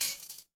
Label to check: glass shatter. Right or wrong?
Right